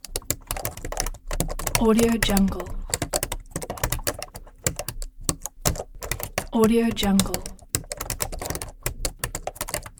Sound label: keyboard typing loop